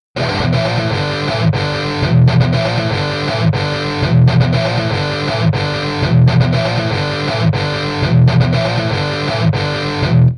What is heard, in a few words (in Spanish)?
distorsion